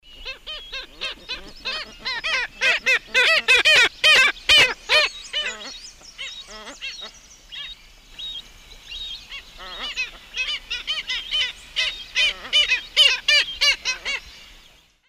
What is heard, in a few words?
Rynchops niger, calls of pair; S. America; Eastern Lowlands